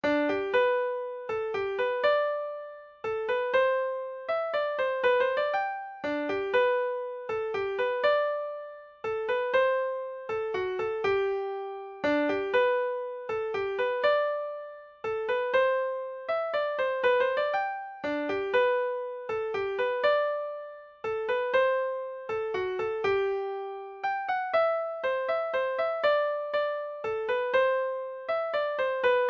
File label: mazurka; charlie lennons; irish